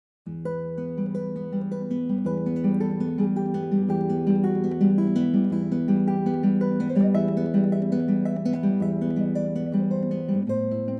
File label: Classical Guitar